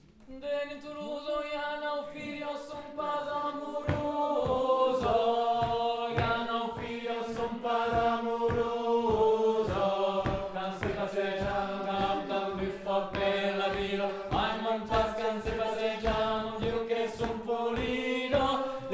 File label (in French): Voix bourdon haut